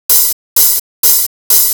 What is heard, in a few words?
Open Hat; Sonic Foundry; Afterhours EDM; Session 15; Nu Life